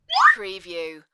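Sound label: Slide Whistle Rise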